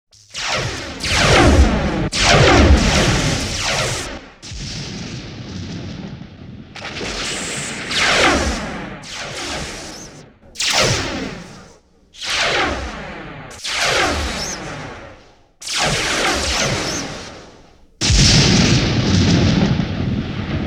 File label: Space Battle, Sci-Fi; Sound Design